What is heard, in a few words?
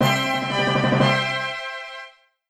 Jingle Comic Start